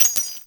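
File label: kar98 shell case fall; WAR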